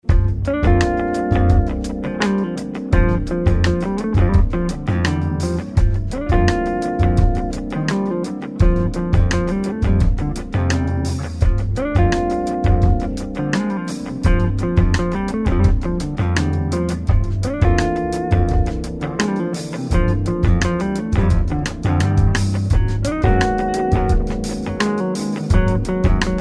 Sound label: jazz